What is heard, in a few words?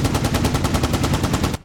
30mm cannon, gunfire; Helicopter